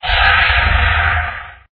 magic evil

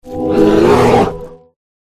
Bear

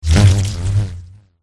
boss attack voice bottom; zigred

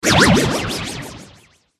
mage male buff

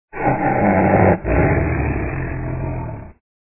tiger death

